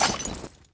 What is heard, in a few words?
knife deploy; weapons